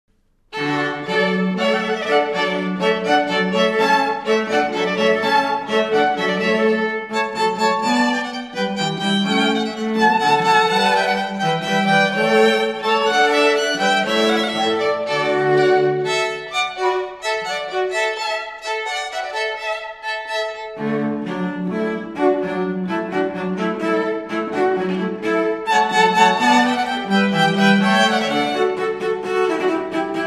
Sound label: hornpipe water music